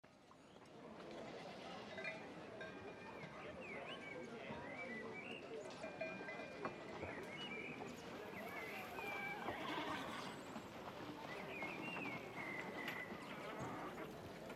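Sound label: ambience quest board loop; ambient; everdawn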